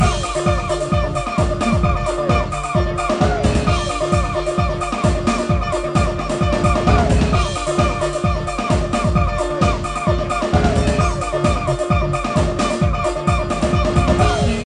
rhythm rave

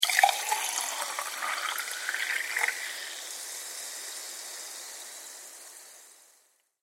champagne pour

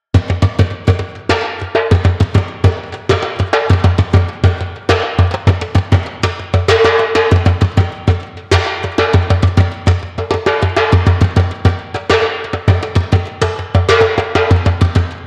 drums 100bpm; A beat played on North African Darabuka metal hand drum with a plastic skin; 100 beats per minute

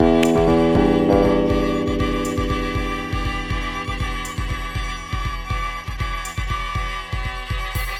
drama frantic loop, 120

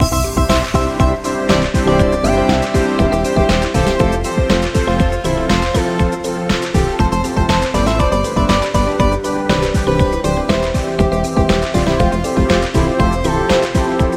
trance loop 120

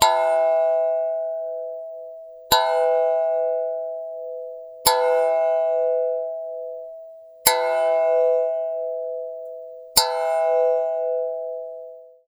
repeated bell

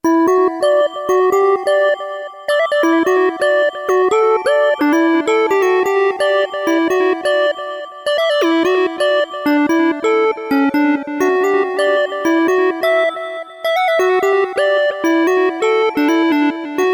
jingle jig

